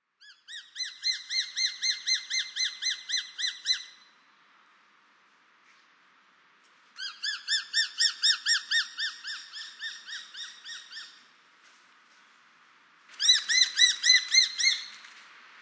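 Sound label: Japanese sparrowhawk, utsunomiya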